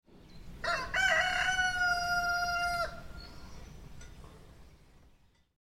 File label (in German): Hahn